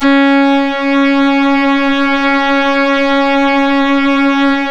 C#, strings; Big Fish Audio

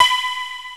BARREL, K1 KAWAI; East West; Global Sounds

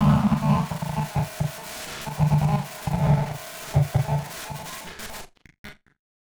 Battered Synth; malfunctioning synth samples

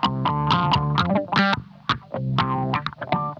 DIRTY OCT, Funk Guitar; Zero G - Funk Guitar

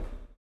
STOMPS; Spectrasonics; Vocal Planet; Blues Soul

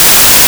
WHITE NOIS; AKAI S6000 CD-ROM - Volume 5; NOISE

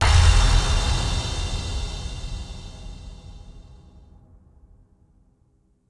Percussive Stab

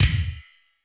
hard drums; 90 sSampleCDs; Crypton - DrumBase5000

